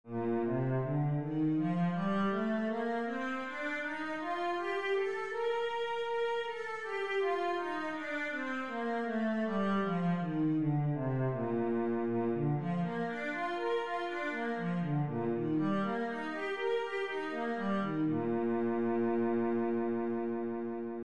b flat major, cello

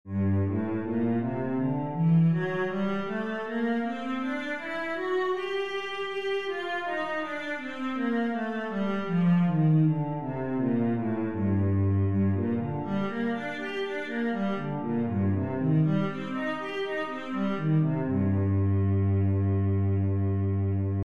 g minor, cello

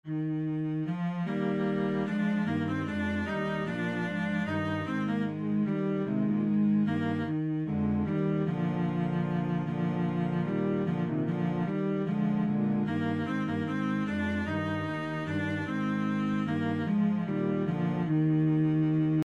minuet, cello

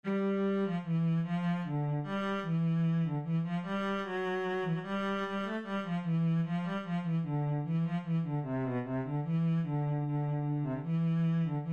Kyrie cello

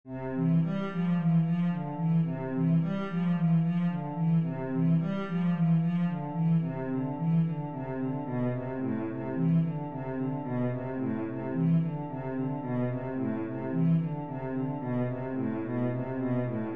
etude, cello

